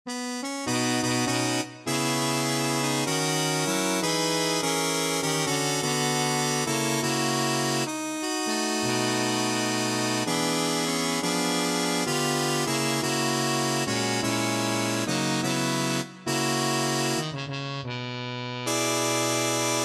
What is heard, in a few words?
All Parts, barbershop; Barbershop Tags